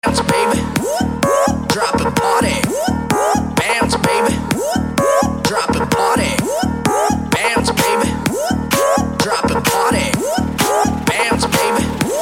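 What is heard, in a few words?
Electronica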